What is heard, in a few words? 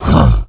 grunt, roshan; weapons; dota; creep